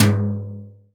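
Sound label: BBLT MED, 50S BRUSH KIT; Northstar - New Gold VOL-2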